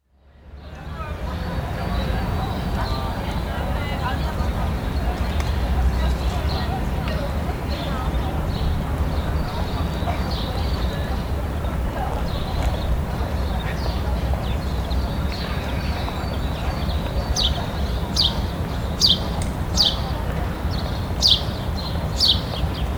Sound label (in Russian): shumnyj grod s vorobem; Звуки города